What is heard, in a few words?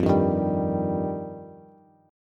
F 7th Suspended 2nd, Bass; F7sus2; strummed; JGuitar